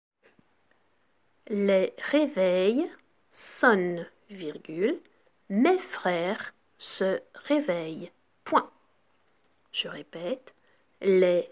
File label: Vocal